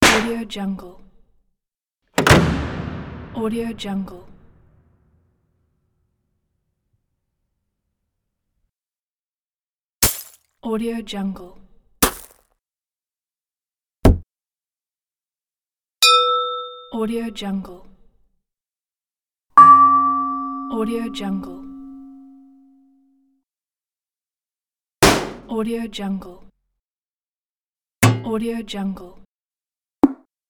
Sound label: impacts and hits pack